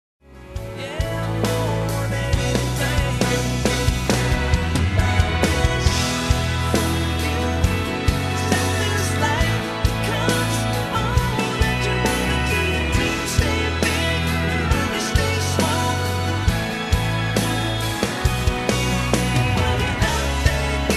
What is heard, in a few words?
karaoke